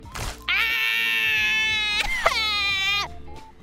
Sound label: fell; IRyScream